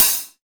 nice foot cymbal C# key, Royality free hat sample tuned to the C# note. Loudest frequency: 10254Hz; C sharp